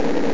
Motor; Raid

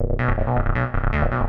rubber, bass, 160bpm; hous-tec; Magix Music Maker & Editor

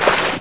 water step; Ferazel's Wand Demo

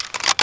springfield pickup, weapons foley; Spearhead Demo; MacFormat 2003 June

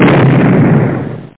explo; blackshoot

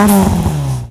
pop; Car

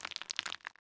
noise

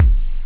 rim, drums misc; OctaMED SoundStudio V1

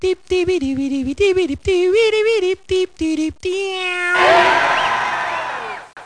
dip dip; CD-ROM Magazin 1996 September

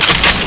pulse

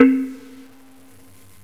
kalimba; GMTones; Ethnic

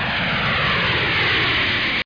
plane

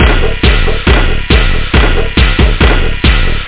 breakbeat

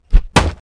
bod strk, bugs; Starship Troopers

